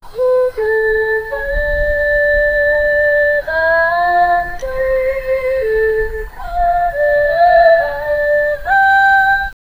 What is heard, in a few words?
a lady running in a